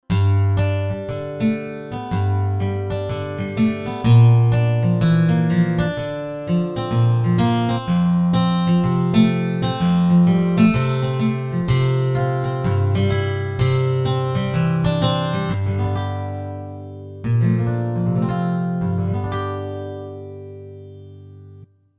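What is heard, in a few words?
Guitar Brush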